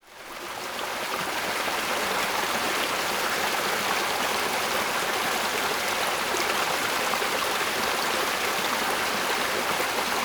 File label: Rapid small river flow (close perspective); Water Flow; Sound Ex Machina; GDC Game Audio Bundle